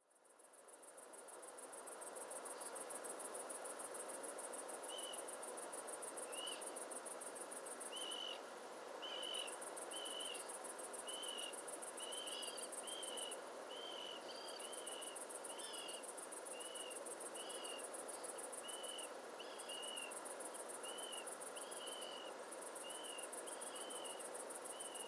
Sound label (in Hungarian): kuszvago cser hangja